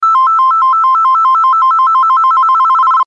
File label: message tone